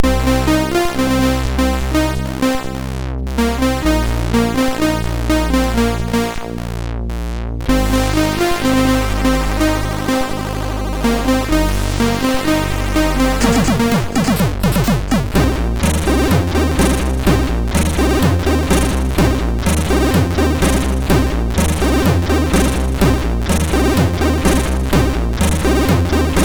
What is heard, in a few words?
tune, MOS6581R2